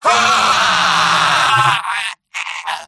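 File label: medic pain critical death; vo; tf2; mvm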